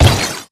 imp bullet lightballistic shieldwall; Titanfall 2

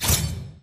Notification Right Slide In; HUD; Titanfall 2

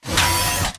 Stalker Mvmt Servo Damaged Light Medium; Titanfall 2

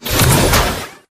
atlas eject servos; Titanfall 2

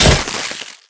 imp bullet generic headshot android; Titanfall 2

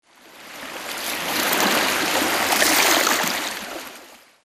swampland water lapping; Titanfall 2

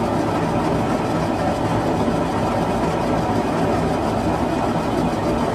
Prop Warehouse Prop Rack Move Dist; Titanfall 2; Boomtown

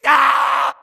grunt death crush; Titanfall 2

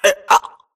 grunt death neck; Titanfall 2

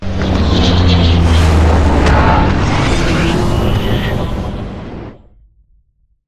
strafe close; Beacon; Titanfall 2

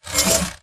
Mvmt Servo Light Short

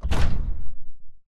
atlas footstep jog; Titanfall 2

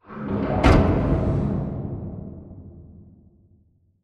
Giant Pillar Close Activate; Titanfall 2; Boomtown

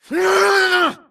grunt electro; Titanfall 2

